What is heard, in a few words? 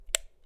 Clicking of light switch